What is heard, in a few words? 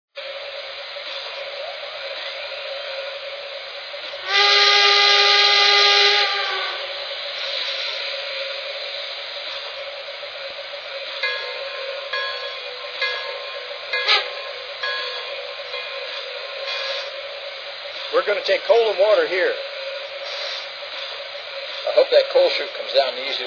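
Allegheny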